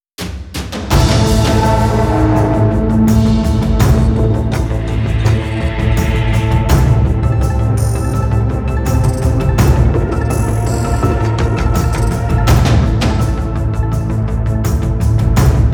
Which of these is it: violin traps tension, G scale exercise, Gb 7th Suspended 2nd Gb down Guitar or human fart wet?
violin traps tension